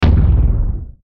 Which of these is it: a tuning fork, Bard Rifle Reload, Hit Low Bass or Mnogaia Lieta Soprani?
Hit Low Bass